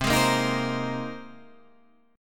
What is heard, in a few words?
C Minor 7th Sharp 5th, Guitar, Standard; strummed; JGuitar